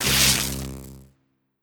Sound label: Skel Drake Elec Start